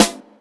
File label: clean steel snare drum sample c key, Royality free snare one shot tuned to the C note